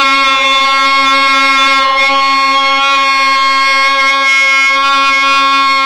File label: vuvazelas; pacificpelican us podcast